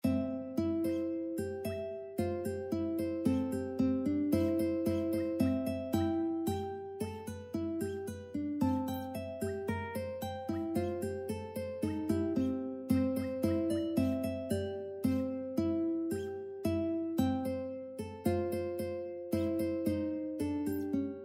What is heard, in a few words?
UKE